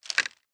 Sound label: pick bush